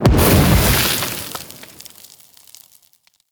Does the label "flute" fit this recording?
No